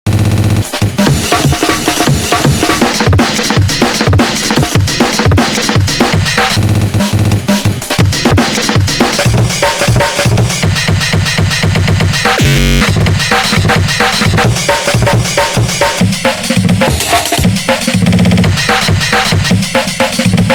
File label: Drums, Fan-made Music; Pony Music Archive 20.09; Friendship is Traumatizing - Season 1